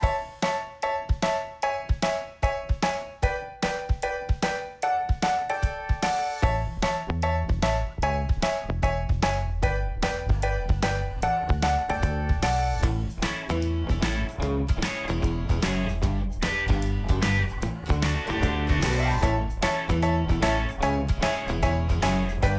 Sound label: Music Bed